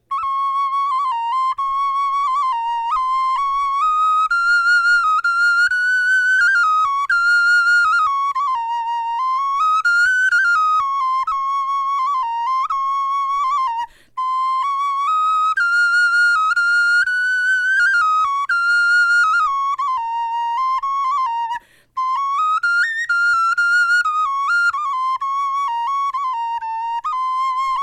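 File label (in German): melodie, Knochenflöten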